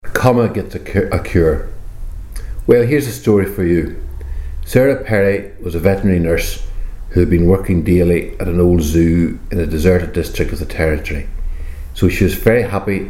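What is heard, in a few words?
Raquet Gallop; reading passage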